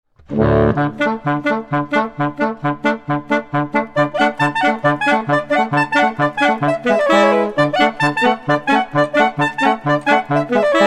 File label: Romanian Folk Dances